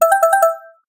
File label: prompt distracted